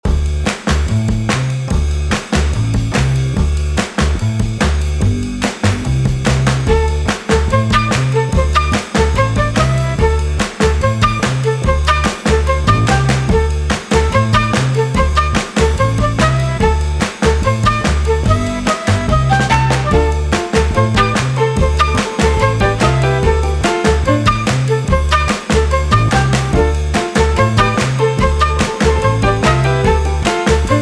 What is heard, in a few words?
music background looping